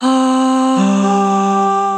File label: Chromatic Voice; Chromatic Voices; Basic Voices; Ableton; Factory Packs; Voice Box